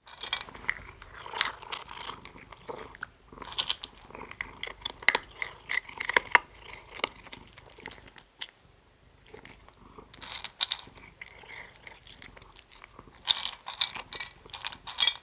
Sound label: Cat Eating Dry Food; افکت صدا حیوانات; Animals